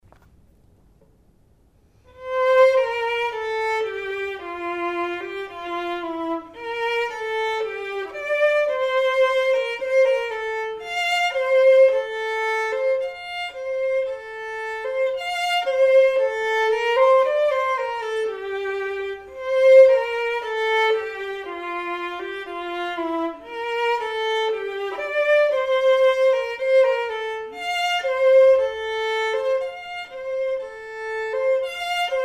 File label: perf vln